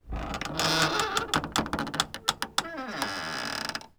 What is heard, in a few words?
door wood old creak; WAR